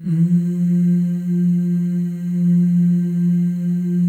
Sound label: FEM, Symphony of Voices; Spectrasonics